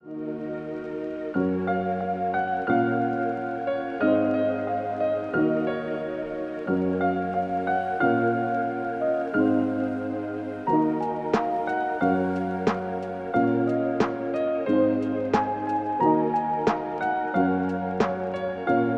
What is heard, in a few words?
Instrumental Lo-Fi